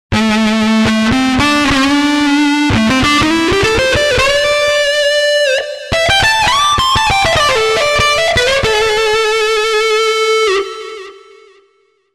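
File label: FET Guitar; TURBO VL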